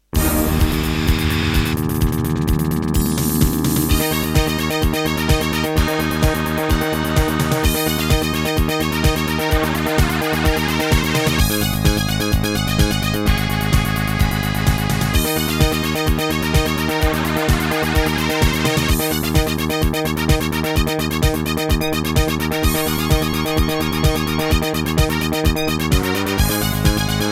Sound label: Terratec MiniWaveSystem TTWT; Wacky Wheels - Turbo